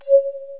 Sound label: cursor